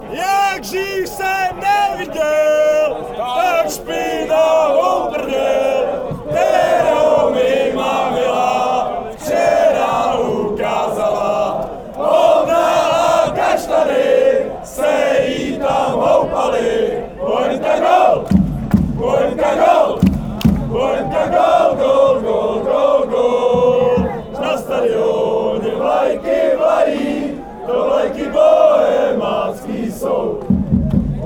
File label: bohemians chant, fanchants